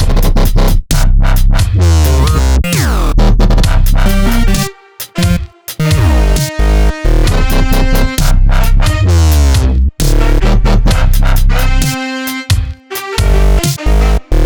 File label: dubstep